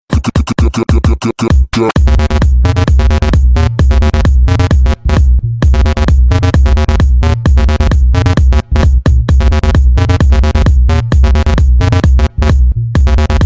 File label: Tribal